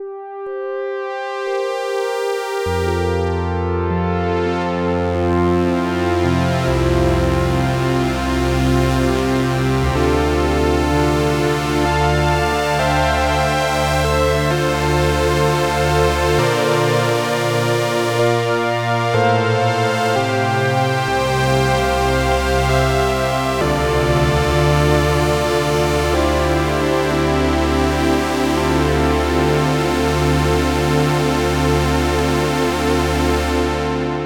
Dream Pad

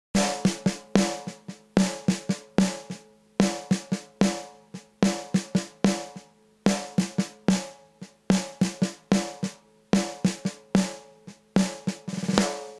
Heritage Audio HA 609A Snare complimit

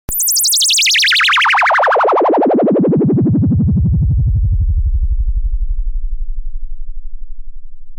PPG red Filter Drive

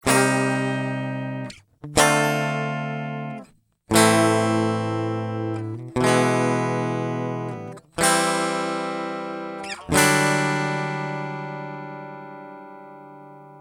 mbox pro les Paul clean

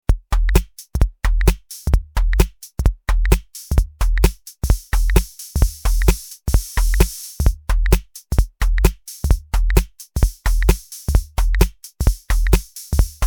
MFB Tanzbär Lite Minimal Shuffle

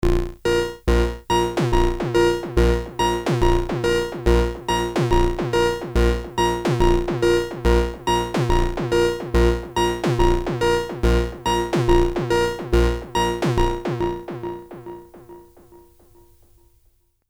Moog long clean Delay; T-REX Replicator Module

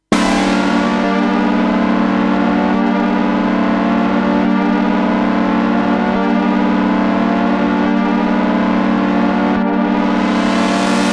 SFX Phasor Rise

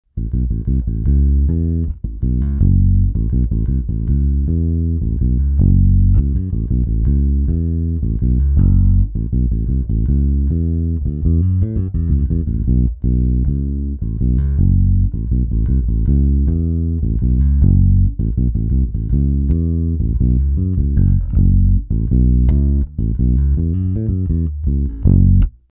spector pj bass boost

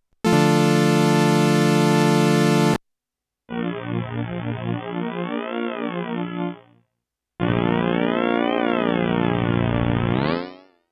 spectraphon chord resynth; Make Noise

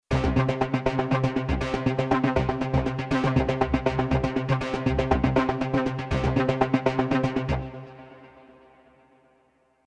Pulses 16ths 6/8; Elysion 2 ORION

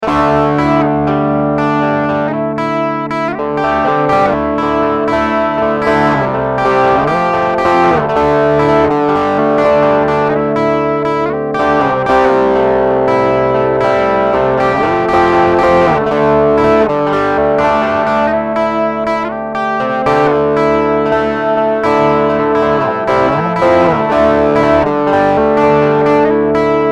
overdrive

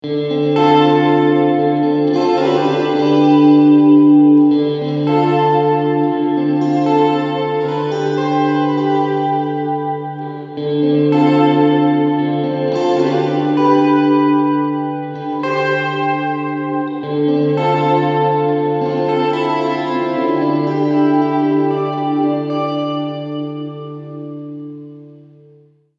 Plate Maximum

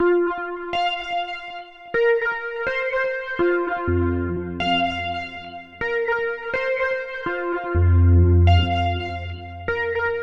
Screaming Organ; Groove Synthesis 3rd

